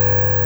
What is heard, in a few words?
highpass sample